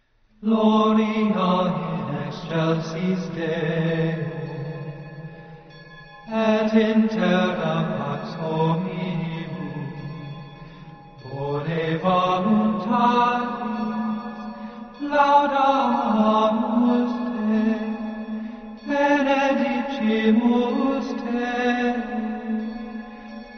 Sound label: chant; from hermitage